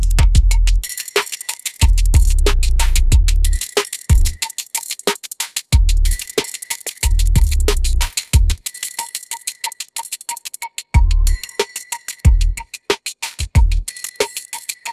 shadow trap groove, ringtones

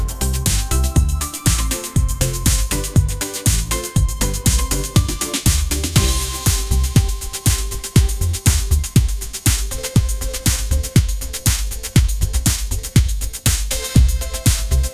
bright pop ringtone groove; ai

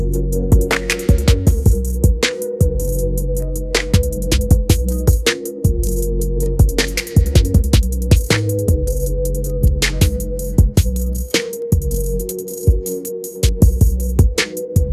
soul groove; ai

